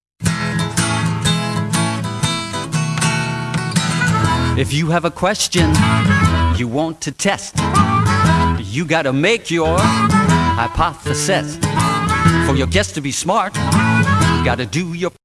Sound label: scientific method blues